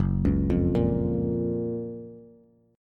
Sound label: F sharp Major 7th, Bass; arpeggiated; JGuitar